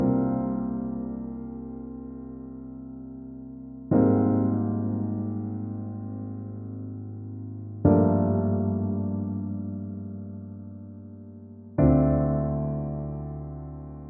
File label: 122 BPM Piano Chord Progressions Cinematic Drama Dmaj Cmin Tonic D; MMB